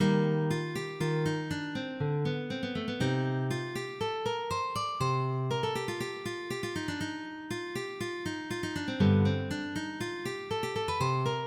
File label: Ricercar, MIDI